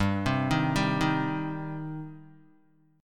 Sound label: G Suspended 4th Sharp 5th G, Guitar; arpeggiated; 2,3,3,0,3,x; 4F,4A,5C,5G,5C,6E; JGuitar